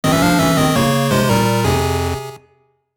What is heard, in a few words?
Bonus Lose; gb